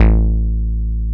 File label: GRUFF A1, BASSES; Best Service Alpha Dance vol.2